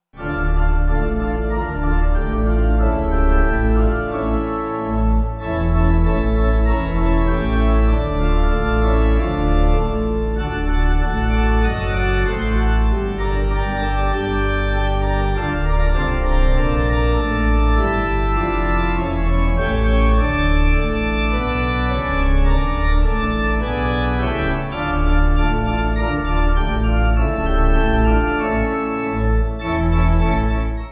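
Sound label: Pipe, hymn backing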